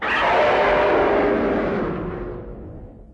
Roar; Battra; Heisei

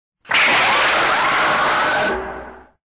Zigra Roar

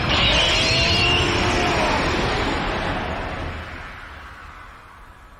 Roar; Juu Riser

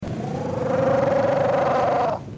Amphibion roar

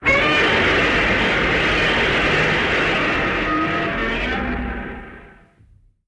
SFX; Godzilla

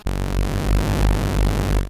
Mr Meringue Roar; Movie Monster Game

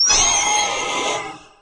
Roar; Megaguirus; Millennium

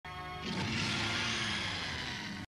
Roar; Shrewster

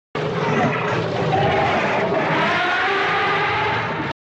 Roaring; Gorgo

